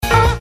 Seagulls

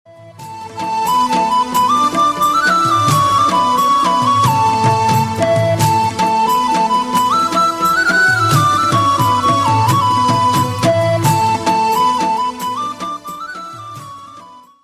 Best Tone

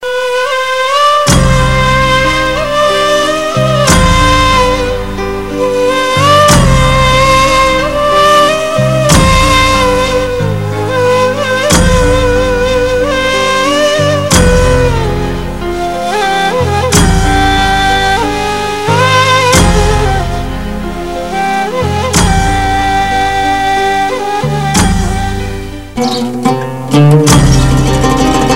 Sad Music

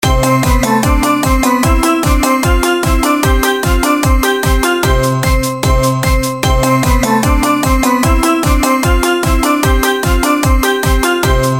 Frantic Mallets

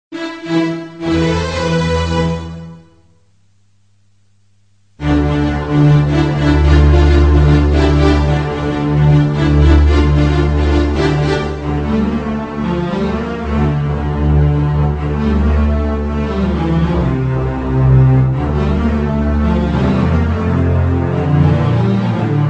Strings

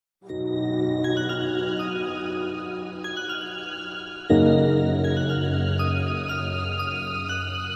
Lovely Instrumental

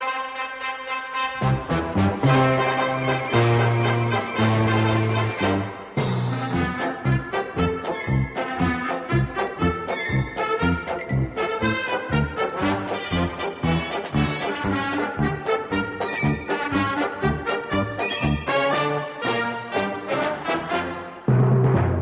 Circus Tone

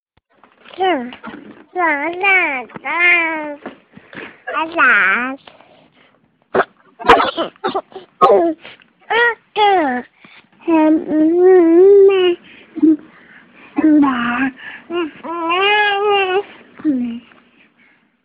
Baby Talk

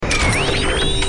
Switch Insert

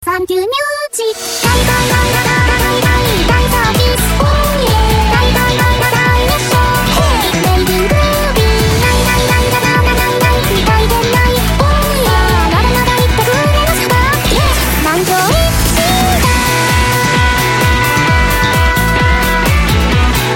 Music ringtone

